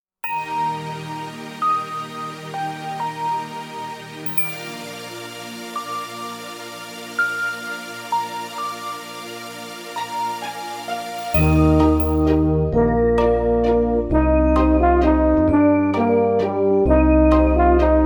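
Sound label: plus Eb major alto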